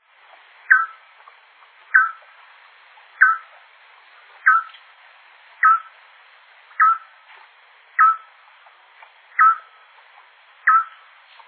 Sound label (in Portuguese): bacurau-cauda-barrada